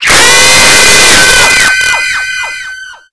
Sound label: monster skill; tox cso; zm skills